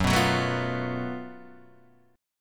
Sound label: Gb Minor Major 7th Double Flat 5th, Guitar, DADGAD, chord